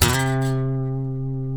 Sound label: D, funk guitars; Spectrasonics; Hans Zimmer Guitars VOL-1; TAKA FUNK